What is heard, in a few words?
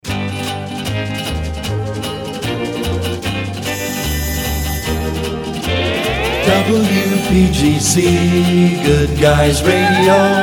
jingles jet set guitar flute; pams series 27